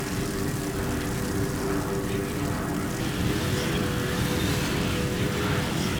molten shield loop; LoL; annie base

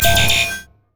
heat seeking missile; LoL; urgot

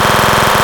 gatling gun loop; LoL; corki

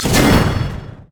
crescent sweep; Xin Zhao; zhaoyun

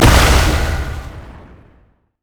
blast; LoL; syndra

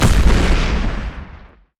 sonic boom; Cyber Ezreal

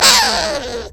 sapling charge; maokai

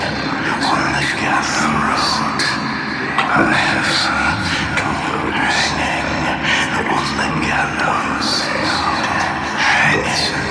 altar unlocked capturing; LoL; altars

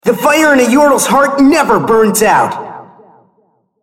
attack; RumbleSkin03

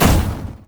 basic attack; LoL; ironforge xerath

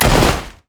snowday qspellbounce; LoL; ziggs snowday

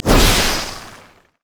q dash; vi; LoL

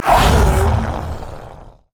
pain; LoL; trundle

